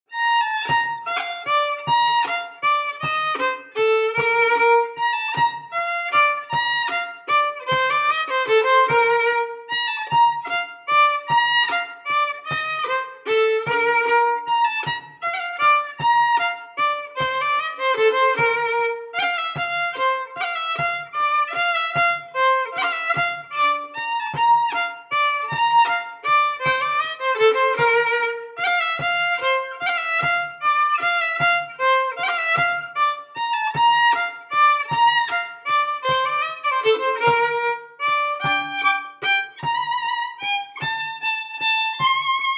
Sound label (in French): Valse